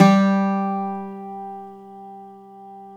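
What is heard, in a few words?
BZ P G, bouzouki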